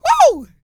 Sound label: E YELL, MALE HUMAN; ILIO; Vocal Planet